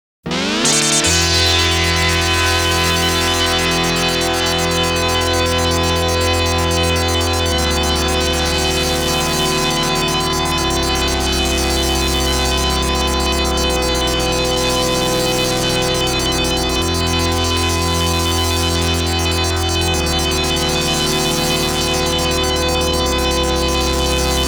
News, generic lead in, radio jingles

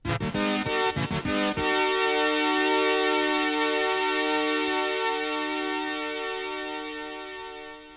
topformat maxi jingle single

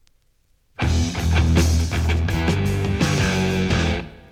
topformat maxi jingle single

